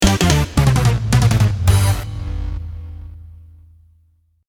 bgm judge fail

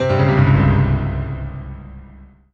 Solo Piano Negative; MUSIC EFFECT; startide

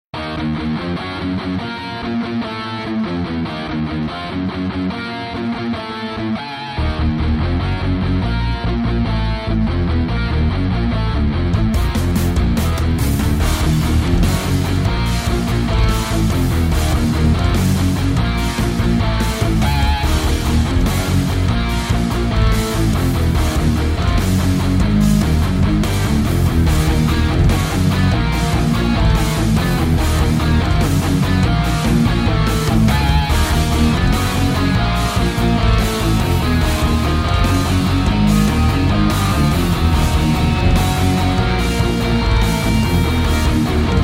music; iDaggers; Android

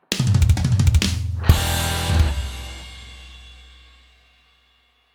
bgm skull fail; Poacher

